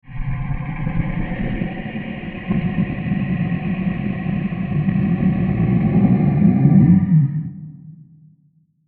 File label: Long Absorptive Horror Transition; Scary Soundscapes